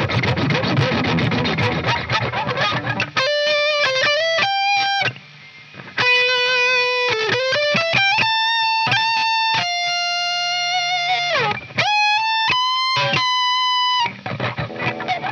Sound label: Chorus Gtr